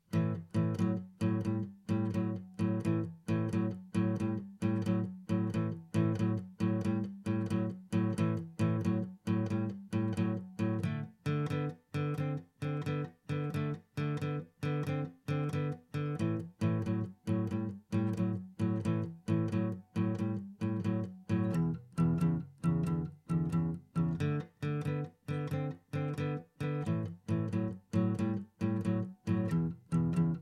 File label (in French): shuffle septième